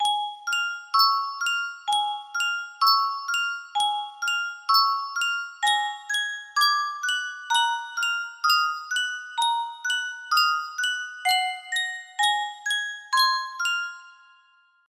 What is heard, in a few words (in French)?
Yunsheng boite a musique